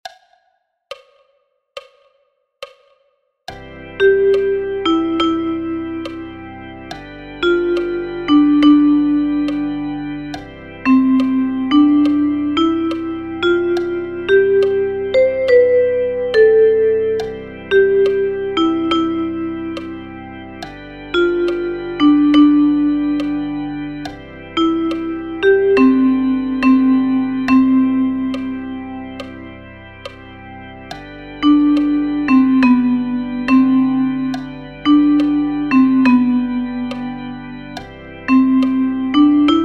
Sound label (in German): Haenschen klein Reggae, Ukulele